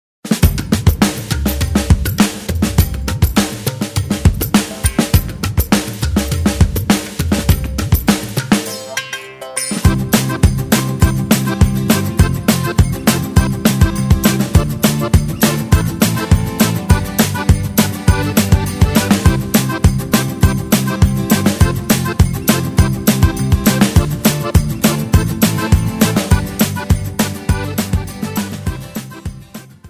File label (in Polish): instrumental, wersja instrumentalna